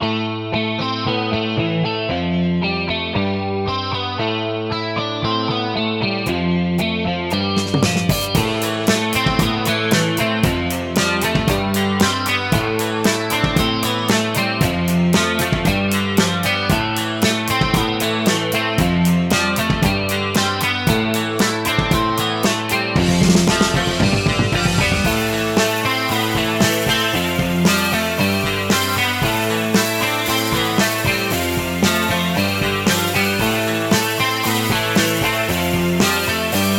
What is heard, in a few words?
guitar